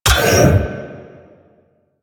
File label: Library Hologram Turn Off; Duviri; Library Island